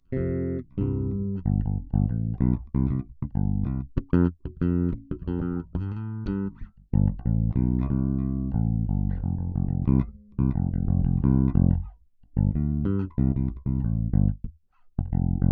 jb reggae; fearn

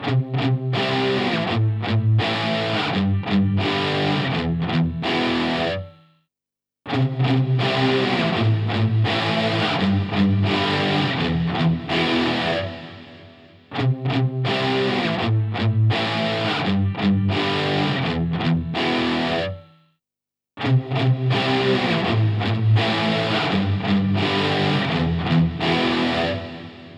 effect onoff

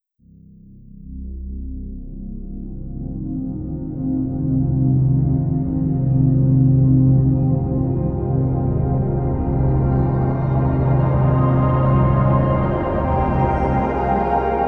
punjabi drone

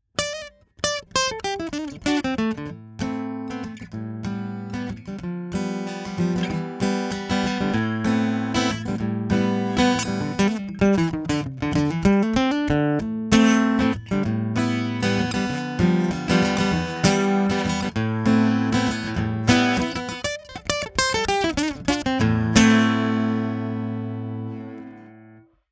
larrivee blues

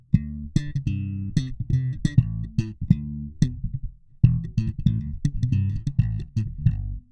slap

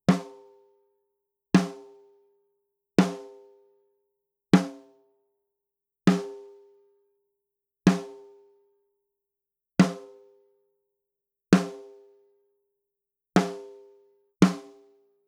snare

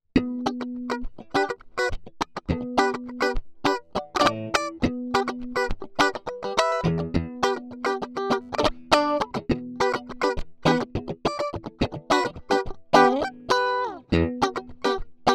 strat funk

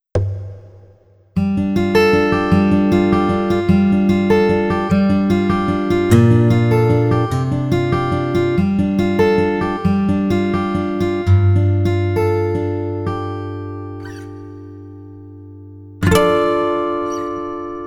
multi fingerstyle

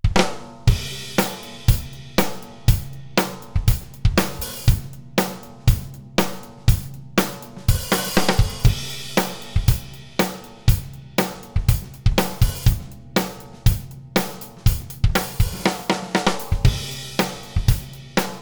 drums

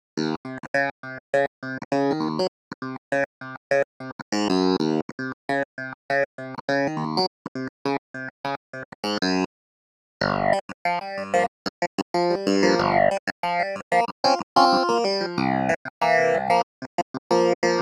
vintage clav

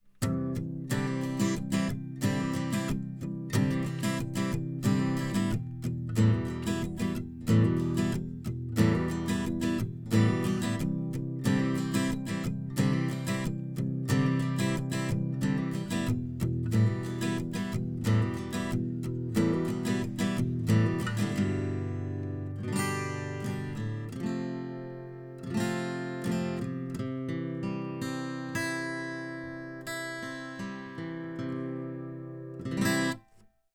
oktava mk 012 takamine

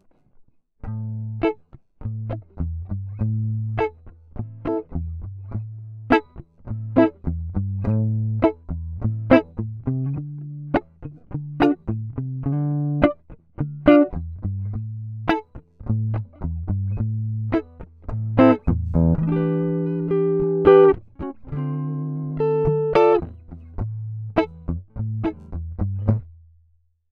soul

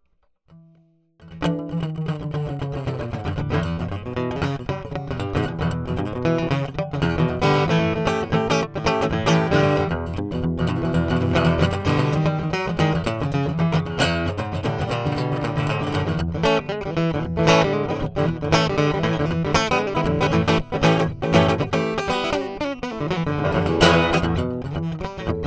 martin blues hot

